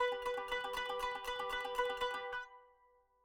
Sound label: kanun